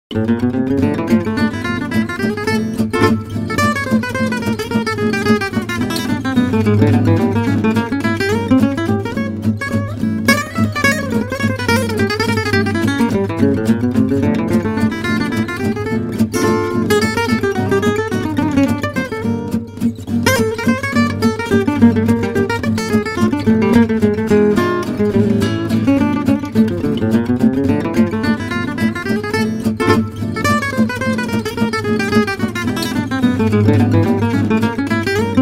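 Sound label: Manouche Valse melody; MyVirtualBand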